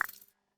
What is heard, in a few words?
unpop fill blank